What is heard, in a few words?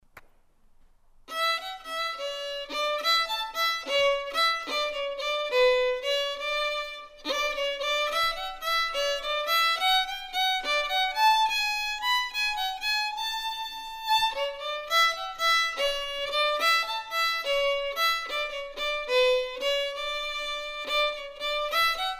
Jig, fiddle; Judique Jig